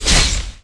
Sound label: pickup